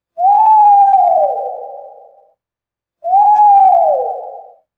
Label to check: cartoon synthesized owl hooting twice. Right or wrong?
Right